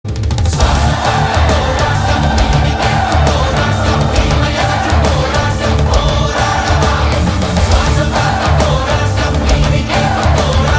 Mass Ringtone

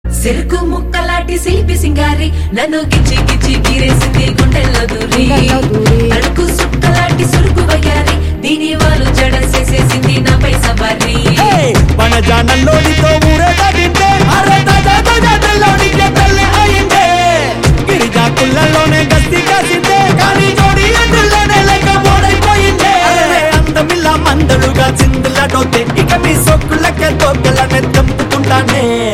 Female Ringtone

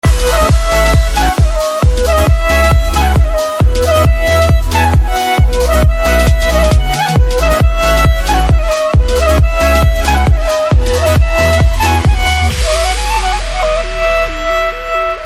BG Tone

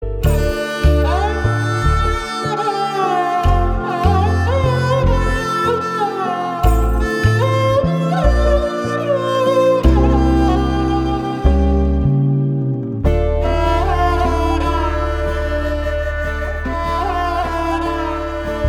Love Bgm

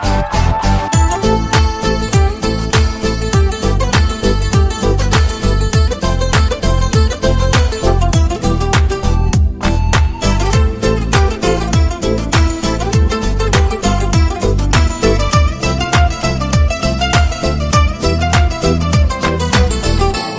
Instrumental Tone

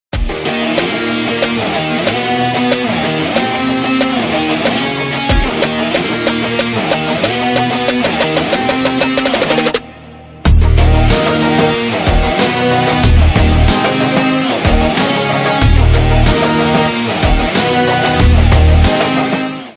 Motion Poster BGM Ringtone; Vakeel Saab

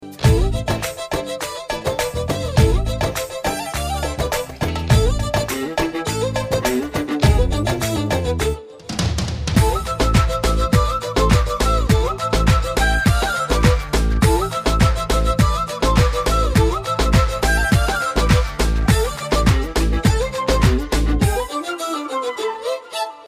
Music Bit

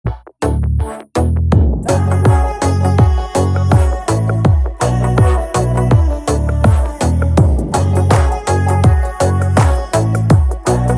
First Time Heart Beat Bgm

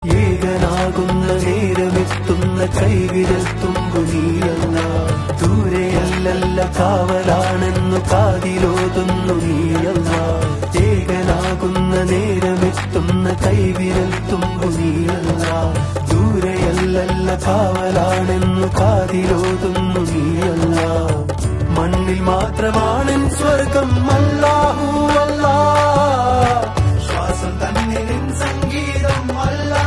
Song Ringtone

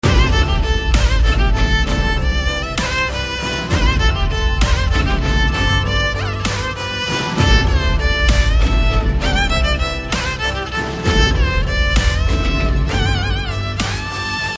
Violin Ringtone; Ashwathama

